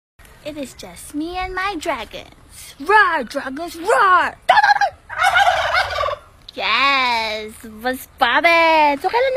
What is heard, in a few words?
roar dragons roar